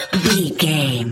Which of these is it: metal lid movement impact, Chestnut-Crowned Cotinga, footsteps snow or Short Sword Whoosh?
metal lid movement impact